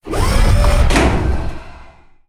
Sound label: Ruins Platform Up